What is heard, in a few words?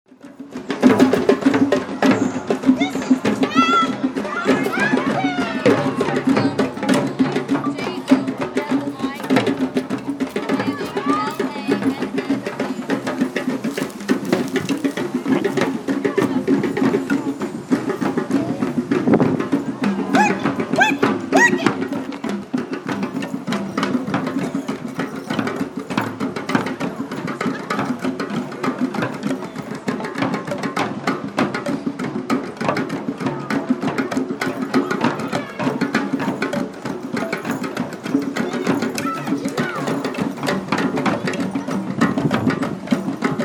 drums